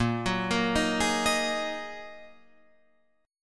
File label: Bb 7th Suspended 4th Sharp 5th Bb down Guitar Drop D